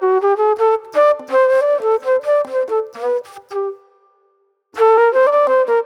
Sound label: mellow soul flute